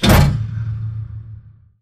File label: pod door close start; ext verb; Titanfall 2